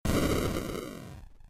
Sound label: minesweeper explosion, arcade